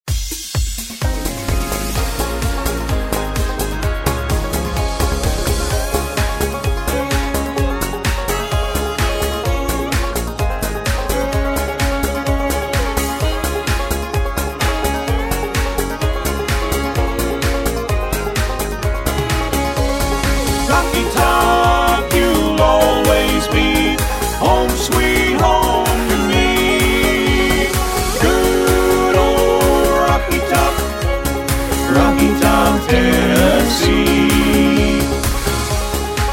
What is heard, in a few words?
harmony sample